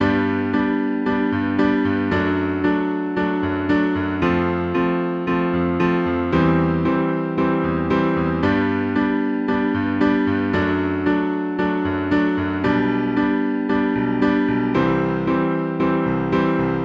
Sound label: g major standard piano pop pattern 114 bpm; LOOPS